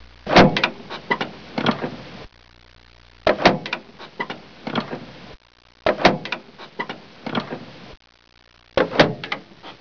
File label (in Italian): Portiera, Veicoli; Effetti sonori